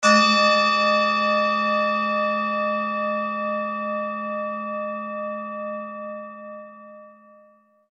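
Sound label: tibetan bowl; neptunset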